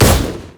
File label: heat seeking; LoL; battlecasturgot